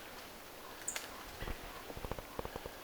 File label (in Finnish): laulurastaslinnun aani